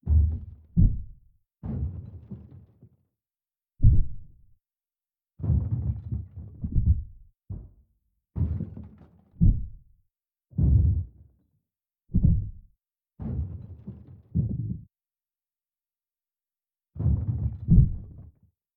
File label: Ghost Banging In Room; Devour; The Town; Intro Sequence